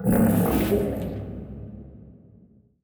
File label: void worms nymph attack; Stellaris; grand archive; ships